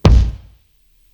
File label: Kick, Psychedelic Drums